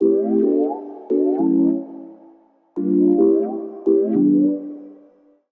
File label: Bender Chord D Major 87 bpm; MIDI Clips; Tonal; Melodic; Ableton; Factory Packs; Chop and Swing